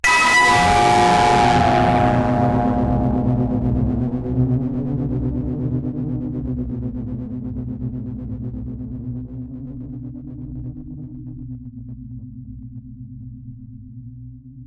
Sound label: Impact Static Blast; bottlerocket